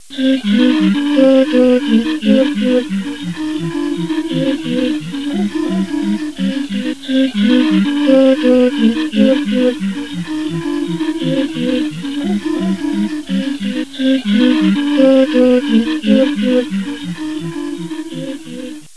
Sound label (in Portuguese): FOLE; INSTRUM